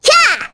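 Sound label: Attack, Korean; voices; heroes; Sonia; kings raid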